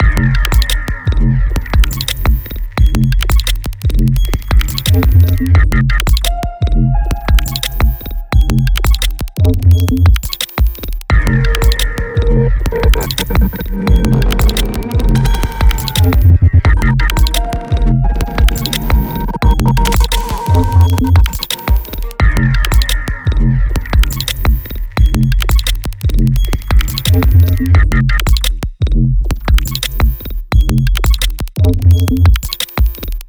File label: minimal glitch techno